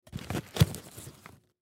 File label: Popcorn Bag Grab, Popcorn Bag Grab Single Food; Single Piece; Household; Blastwave